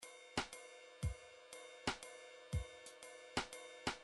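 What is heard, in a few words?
midi drums jazz waltz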